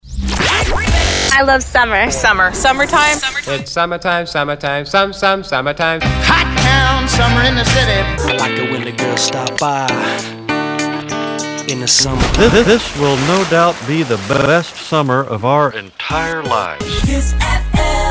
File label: summer splash sounder